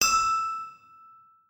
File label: tone